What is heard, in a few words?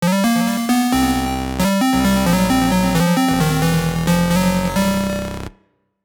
Bonus Lose; donkey kong country 2000 gbc